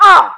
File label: pain; sydney